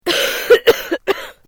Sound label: FEMALE COUGH STRONG; Female vocal sounds; Clearing Throat (Sound Effects:Human)